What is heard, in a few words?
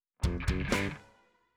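Jingle Plaza Mystery Box; JGL Plaza MysteryBox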